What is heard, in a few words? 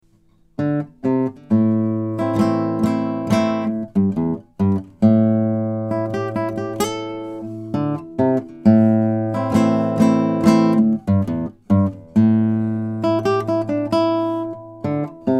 Jungle Blues